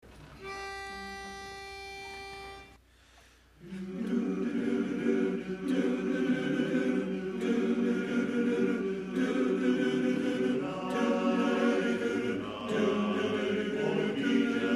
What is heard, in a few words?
lead